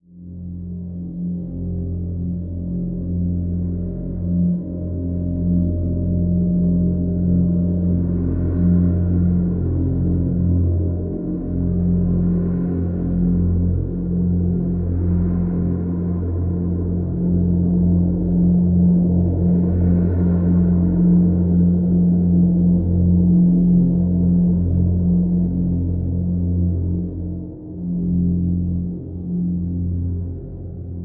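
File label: Drone Caverns Deep F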